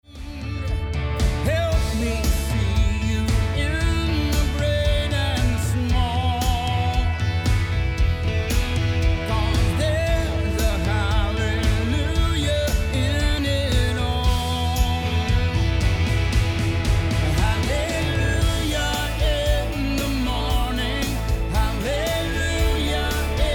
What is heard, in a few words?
Rockmix retro, pultec